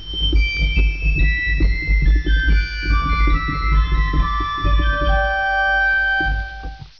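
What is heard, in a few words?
Quinte, organ; Martin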